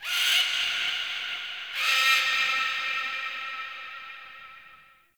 Day Bird Of Prey; Ambience; One Offs; Glades Of Andurin

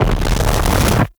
Eruption Build Up; Sargas Ruk

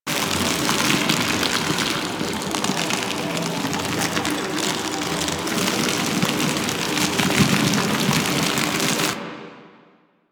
Frost Snow Globe Loop; Warframe; Warframes

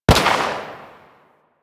Uzi Pistol Shot, weapons; Grn Uzi Pistol

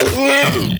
Predasite Vocal Pain; Warframe; Hunting Critters

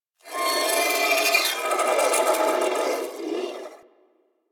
Sentient Symbilyst Idle; Enemies; Barks

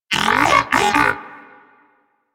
Moa Pet Greeting; Warframe; Tenacious

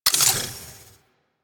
Modular Secondary Clip Mech, Weapons; Warframe; SU Modular Guns

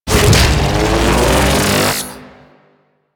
Artillery Charge; Enemies; Abilities; Teralyst

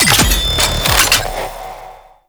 Front Line Ammo Dropoff; Tau; Twelve Minute War

